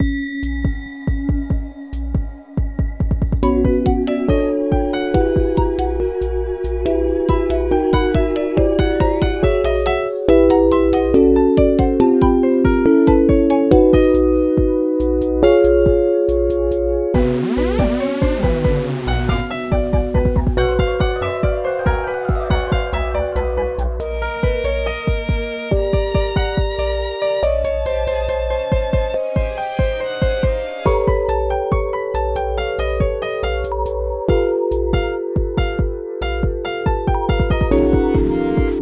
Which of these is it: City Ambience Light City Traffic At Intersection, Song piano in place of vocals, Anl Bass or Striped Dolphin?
Song piano in place of vocals